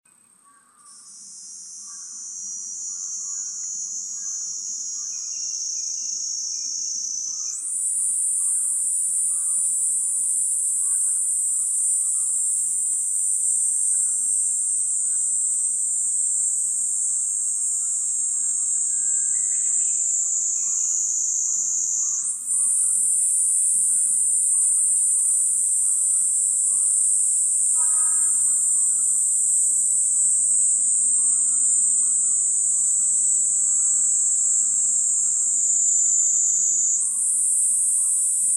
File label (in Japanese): niiniizemi solo, insect